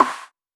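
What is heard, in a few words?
TR909 Snare, Roland SH-32 Drumset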